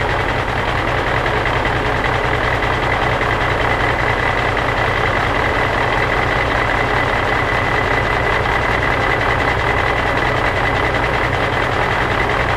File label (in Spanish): MOTOR REPOSO; SIMUZENSOUND